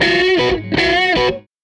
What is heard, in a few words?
Tele Guitar Loop